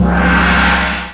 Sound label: drums gong; The Atari Compendium (Toad Computers) (1994); music